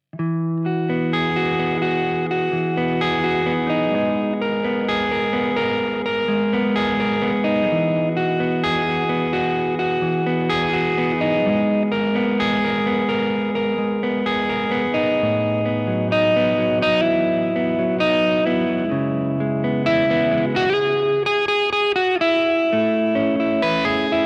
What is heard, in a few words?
Capo, pickups